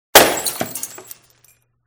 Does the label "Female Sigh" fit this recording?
No